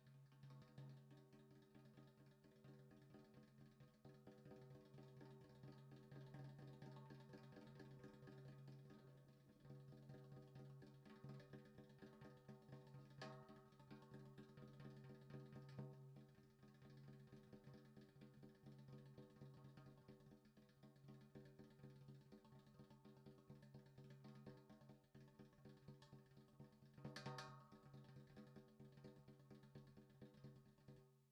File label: ting cymbal